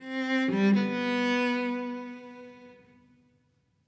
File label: Music Level Argument Stinger; Krish